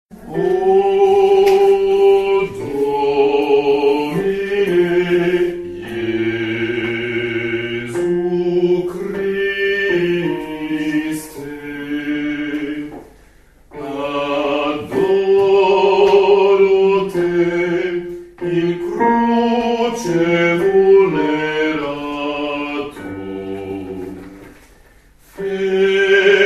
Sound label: O Domine Jesu Basses